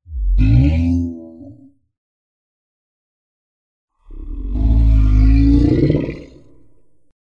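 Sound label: sounds of demon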